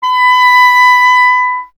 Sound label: clarinet B; Miroslav Vitous; Solo Instruments VOL-1